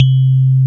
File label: TINE; Wizoo - Powered DX; DIGIJAZZ